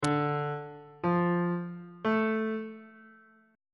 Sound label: d melodic minor chord 1 is d minor on bass clef